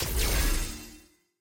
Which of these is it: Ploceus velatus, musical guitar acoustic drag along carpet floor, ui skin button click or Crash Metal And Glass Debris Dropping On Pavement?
ui skin button click